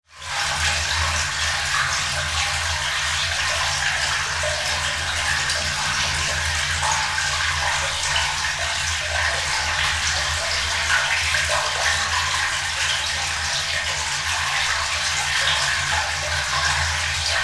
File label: stereo recording of storm drain in Oakland, CA; Naturaly Occuring Textures